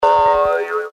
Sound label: boing